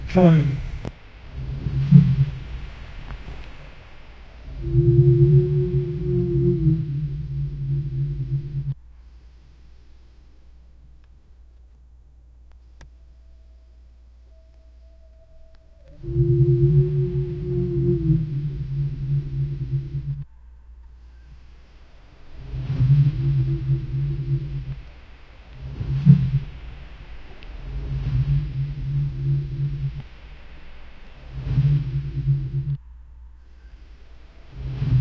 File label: gentle book flip magical